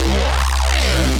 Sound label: C Bass, DnB One Shots